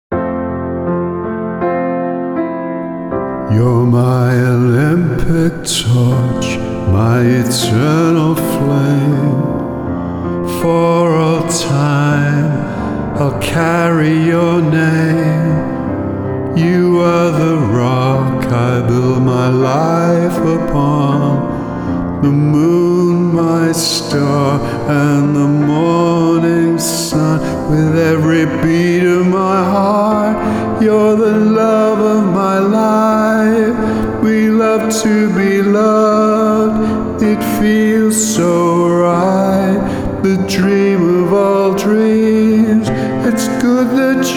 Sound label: 80 BPM in F